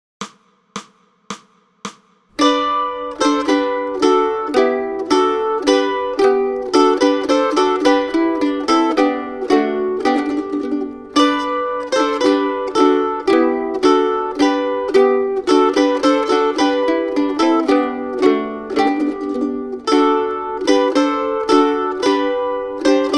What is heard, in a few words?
Deck the Halls Mandolin 1 2 3; christmas